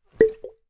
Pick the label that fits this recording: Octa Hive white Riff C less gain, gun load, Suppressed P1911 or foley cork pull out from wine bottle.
foley cork pull out from wine bottle